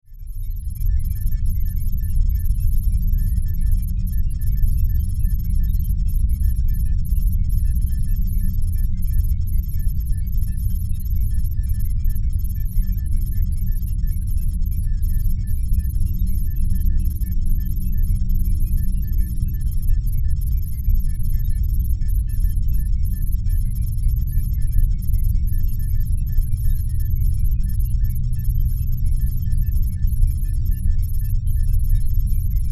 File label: Science Fiction Computer Room Beeps Readouts Deep Rumble; Computers; SFX Bible